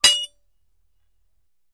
Axe Clinks, Dull; Light Fast Metal Clank Of Axes Scraping Together. - Metal Scrape